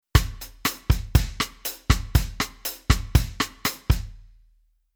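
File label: Rhythm Bossa Pattern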